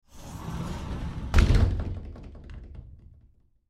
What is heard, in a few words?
Shower Door Slide, Shower Door Slide Close